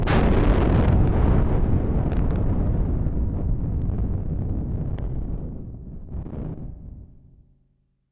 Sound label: explode far